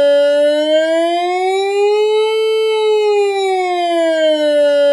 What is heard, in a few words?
Switzerland universal Alarm; Tonfolgen Hella; Dachbalkensystem RTK 7 Konfigurator